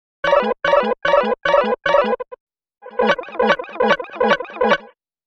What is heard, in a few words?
dolphin, nothing phone